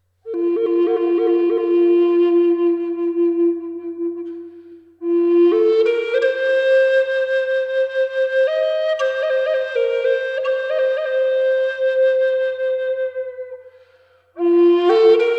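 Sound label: Eastern Red Cedar Fis 432 Hz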